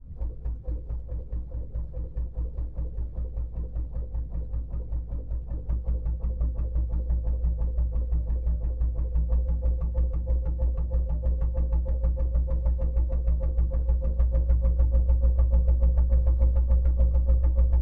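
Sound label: fishing boat trawler onboard slow drive accelerate to fast deck wheelhouse; ORTF; MKH8040; Pole Position; Trawler Hundestedt Hotbulb 1977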